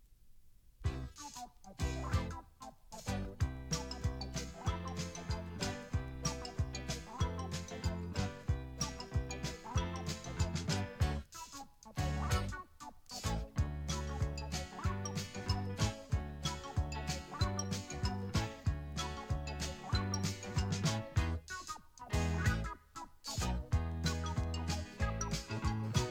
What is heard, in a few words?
topformat maxi jingle single